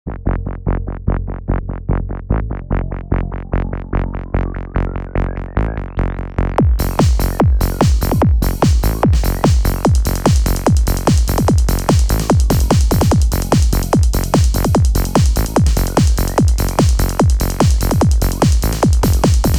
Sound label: Bass, goa trance; Ableton Live 12; instrument racks